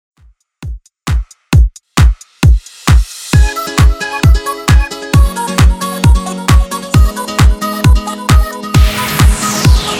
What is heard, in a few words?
90s Redrum 133bpm